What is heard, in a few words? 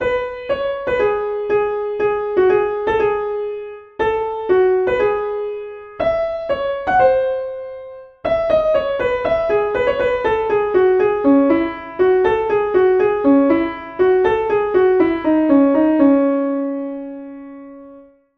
Piano; Five Nights At Freddys